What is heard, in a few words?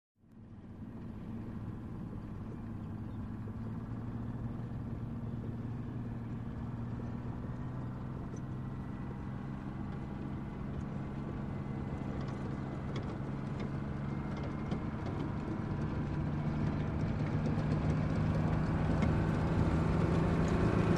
Tank passing by